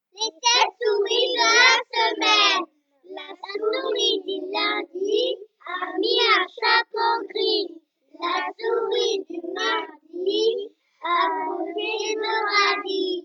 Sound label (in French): comptine souris